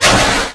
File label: attack; B YORTUS B